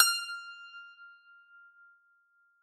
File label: f5 Pick, strings